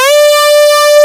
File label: SAW GLIDE D4; Kid Nepro; Elite